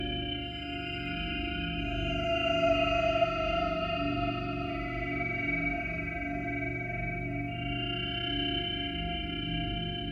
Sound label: Machine Corridor Tense; soma gamerip 2015